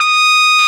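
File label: BRASS CLASST3; Roland LA; ORCHESTRA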